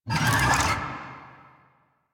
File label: Infested Big Ashen Vocal Pain; Warframe